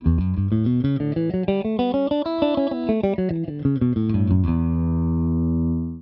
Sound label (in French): Mi Dorien, Guitare; T M2 b3 P4 P5 M6 b7 ( Penta min + M2 & M6 ); Accords compatibles : Mineur ou Mineur 7